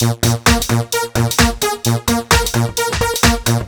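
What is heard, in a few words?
130 bpm no bass drum, combi loops, nu dance electro; Zero-G; Soundclash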